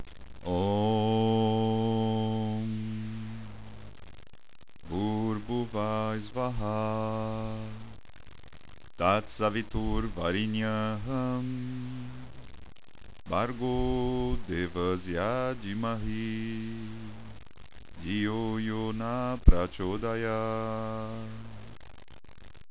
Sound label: gayatri